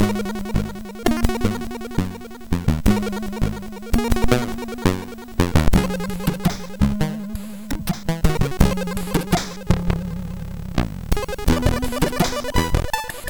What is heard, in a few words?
Acid, sid MOS6581R4; hvsc